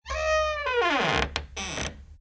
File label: Basement Door Open